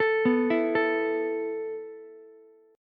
A Suspended 2nd A down Ukulele; Asus2 arpeggiated

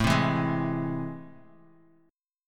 A Minor Major 7th, down, Guitar; strummed; AmM7; JGuitar

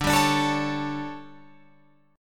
D Major 7th Suspended 4th, Guitar, Open F; DM7sus4 strummed

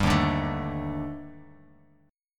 D sharp Major Sharp 11th, Bass Standard; D#M#11 strummed; JGuitar

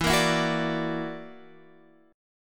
F sharp Augmented 7th E, chord

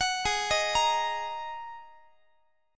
G sharp 7th Suspended 2nd G sharp Guitar; G#7sus2 arpeggiated; JGuitar

